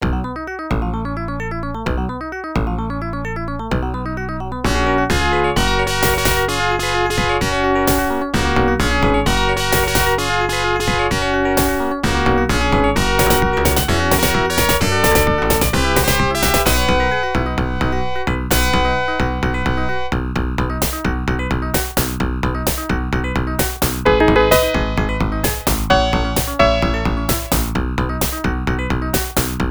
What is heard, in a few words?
Peaceful; wardner genesis